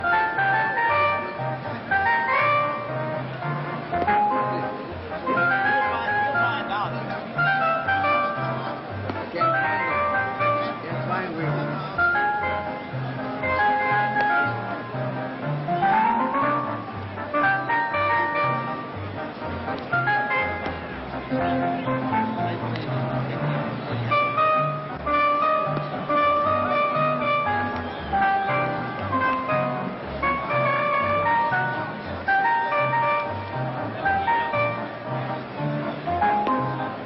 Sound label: music bed; sounder